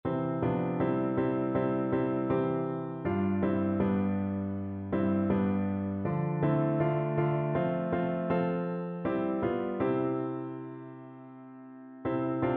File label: Piano